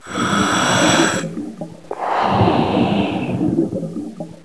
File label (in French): blub